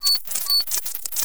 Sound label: Beepy Glitch